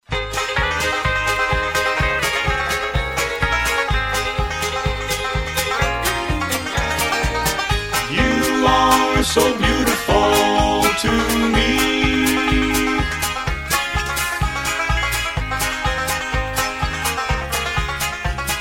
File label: harmony